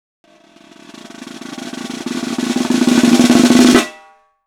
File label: snare roll